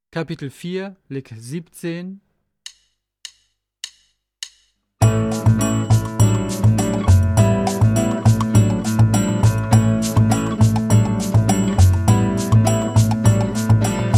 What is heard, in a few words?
Latin Style Guitar